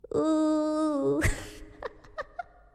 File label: uuuuuuh